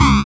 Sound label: SYN OLD, dance synth